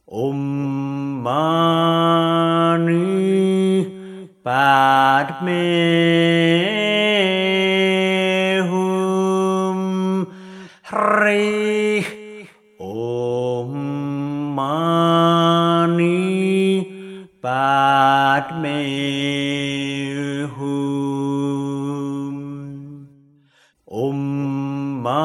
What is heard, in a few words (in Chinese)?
六字大明咒(唱頌); 四臂觀音; 又稱觀世音菩薩心咒